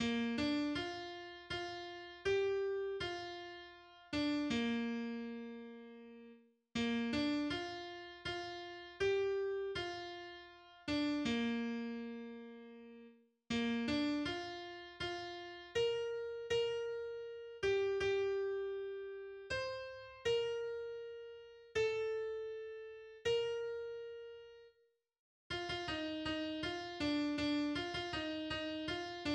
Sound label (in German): Klavier